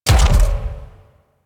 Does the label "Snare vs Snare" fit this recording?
No